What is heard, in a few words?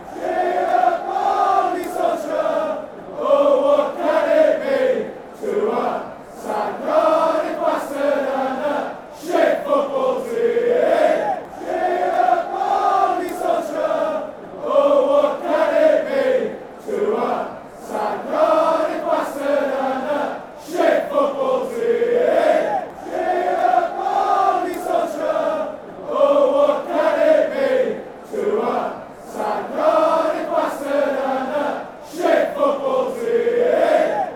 cheer up ole solskjaer